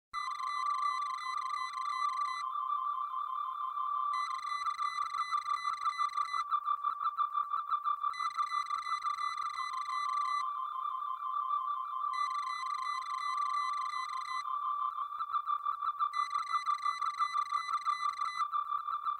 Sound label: tricorder; tng